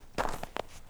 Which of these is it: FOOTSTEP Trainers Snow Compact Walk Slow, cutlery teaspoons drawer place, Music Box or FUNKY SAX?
FOOTSTEP Trainers Snow Compact Walk Slow